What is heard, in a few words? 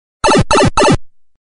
mario pipe sound ringtone